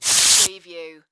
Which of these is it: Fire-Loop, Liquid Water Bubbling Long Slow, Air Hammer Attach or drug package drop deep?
Air Hammer Attach